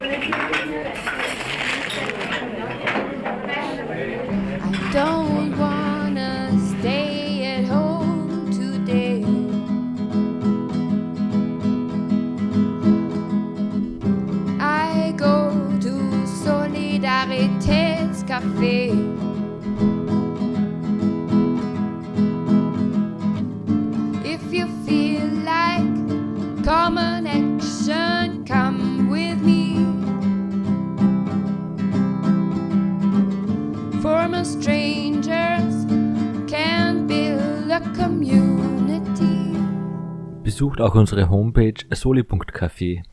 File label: jingle; solicafe